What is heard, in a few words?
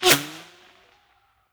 bullet by subsonic; weapons; nearmiss; Counter Strike Model Pack; CS2